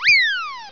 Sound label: whiz; 3M